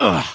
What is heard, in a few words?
troll wound; Halazzi